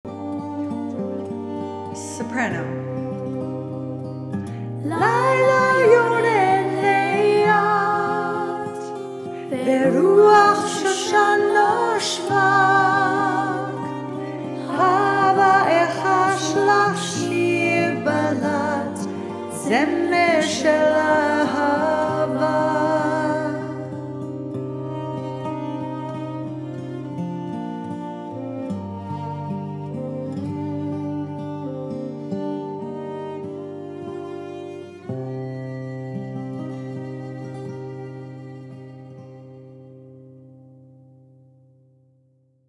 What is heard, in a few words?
soprano